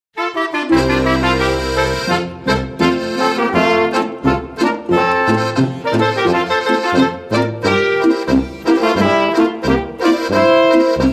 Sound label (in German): boarischer